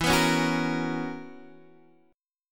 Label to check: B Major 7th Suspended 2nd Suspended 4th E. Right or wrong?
Right